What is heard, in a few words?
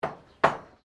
Blacksmith Hammer Strike; Colonial era objects; Hammer Sound Effects; Hammers (Sound Effects:Tools)